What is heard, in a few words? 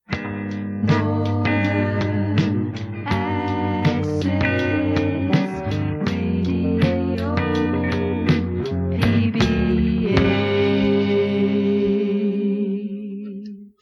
Jingle; PBA FM